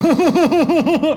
huhuhu

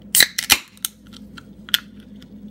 can open sound

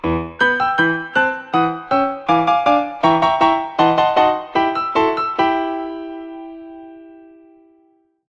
nutcracker classic theme

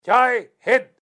war cry

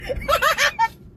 laugh

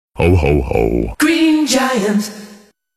hohoho; green giant

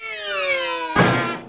failed mission

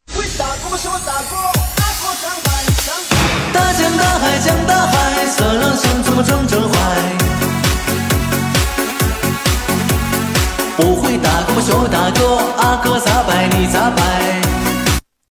chinese guy singing

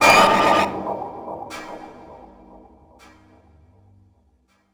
jumpscare; content warning

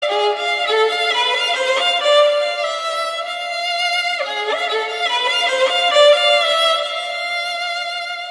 dramatic violin

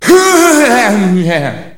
shout

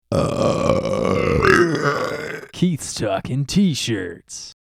shirt drop

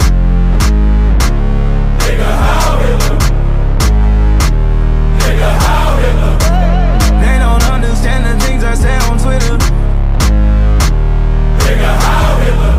goal music

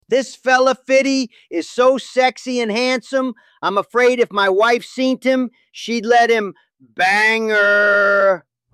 bangerrrrrrr

